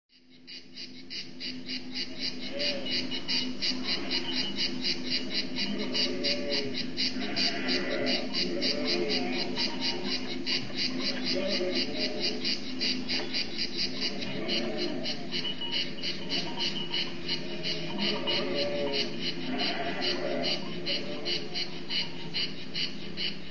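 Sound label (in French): nature cigale ou brebis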